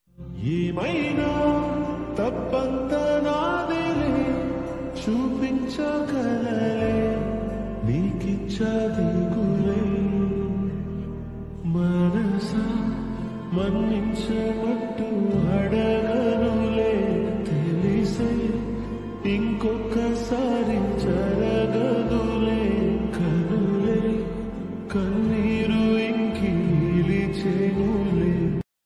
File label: lofi telugu ringtone